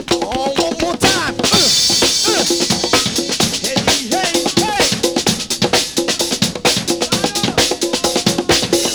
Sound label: breaks, breakcore